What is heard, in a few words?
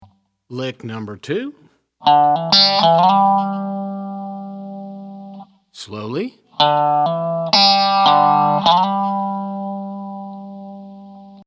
resonator pedal, steel guitar